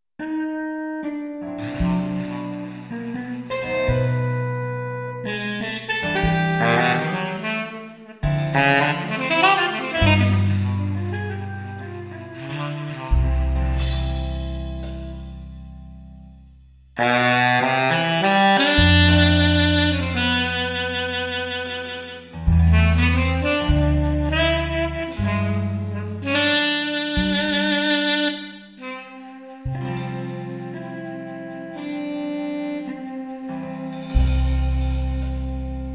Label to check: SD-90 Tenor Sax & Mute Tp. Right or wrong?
Right